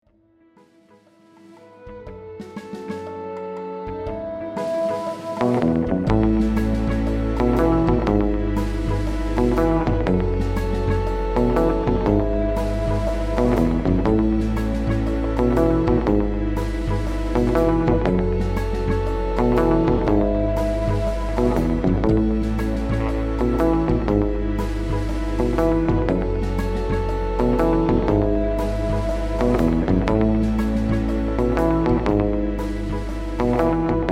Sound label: Guitar Experiment